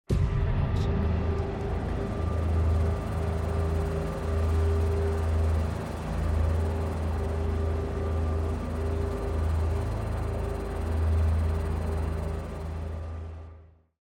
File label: designer magical hit; SoundsCrate